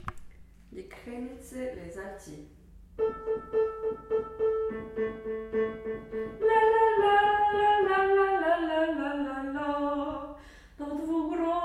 di krenitse alti

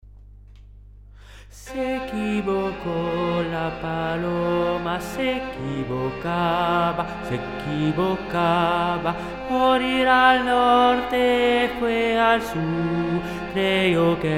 se equivoco basses

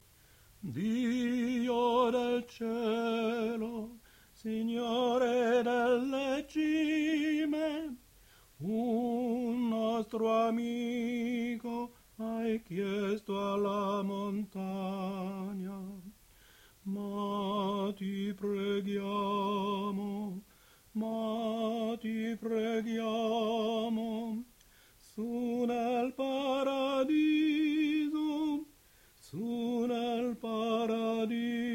tenor

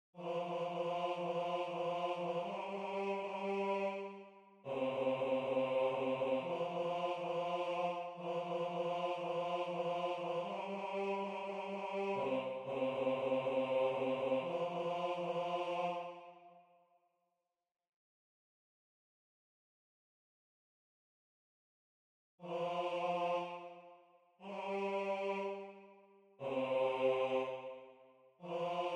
ounenaya basse

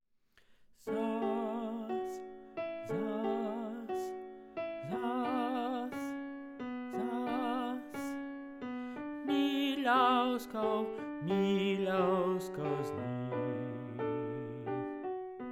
kdyz basse

